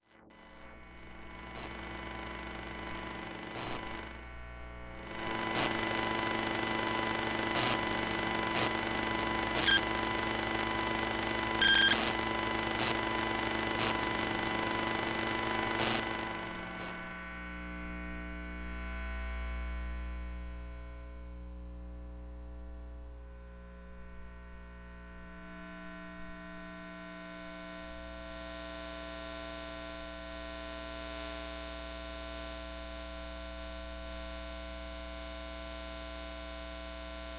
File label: designed circuits source recordings paper shredder; sonniss gdc